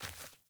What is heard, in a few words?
Sandals Walk Dirt, Footsteps; Characters; Player